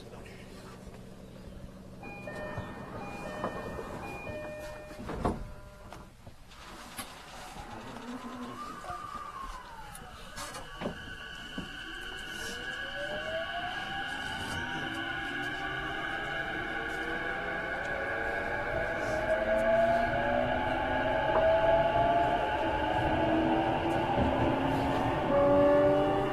keihin tohoku negishi E233, train

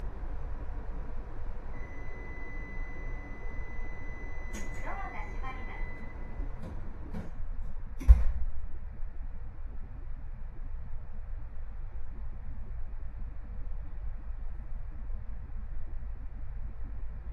shinkansen E2-1000

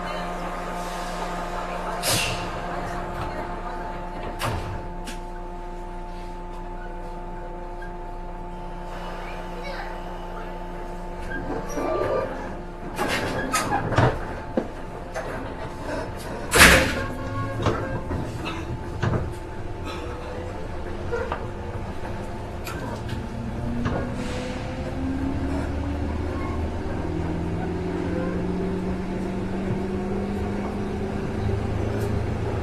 joban rapid narita 103, train

